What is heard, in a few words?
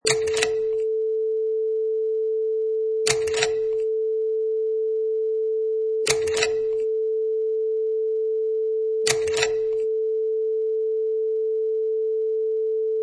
Slide Projector Clicks